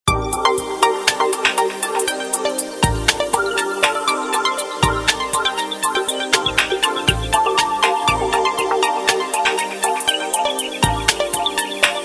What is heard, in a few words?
Square Drive, ringtones; Lenovo Tab3 Essential TB3-710I